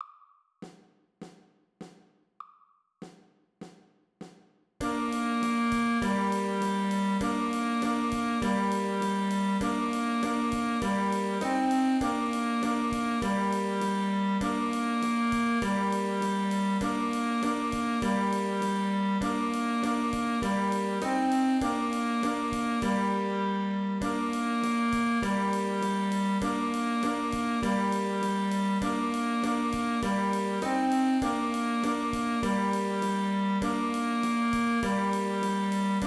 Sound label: Rain Rain